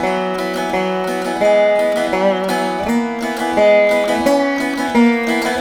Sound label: VEENA; Spectrasonics; Heart of Asia